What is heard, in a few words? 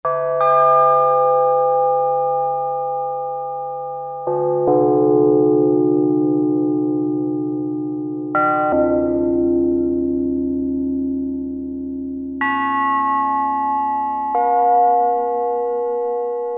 Bell + Atonal, Prophet-5; Sequential Circuits Prophet-5 (Ver.3)